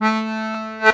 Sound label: ACCORD A1, Accordions; USB Soundscan vol.40 - Complete Accordions; AKAI